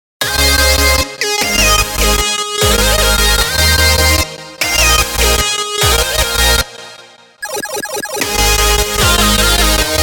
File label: no sidechain for snare